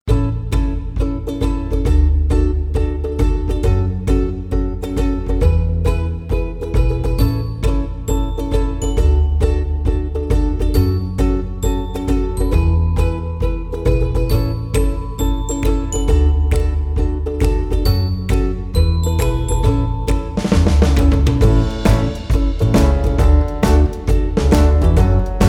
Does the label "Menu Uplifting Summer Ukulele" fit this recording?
Yes